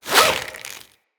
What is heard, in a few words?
Sword And Whip Pull Back